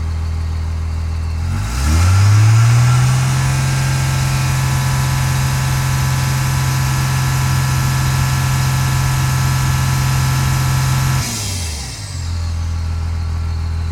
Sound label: Truck Ram Diesel Fast Idle; Trucks